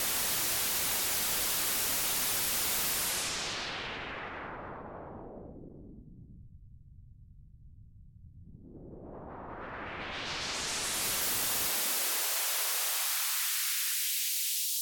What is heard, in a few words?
Filter LP HP; MXR